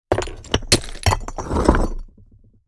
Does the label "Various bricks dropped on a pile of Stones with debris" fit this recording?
Yes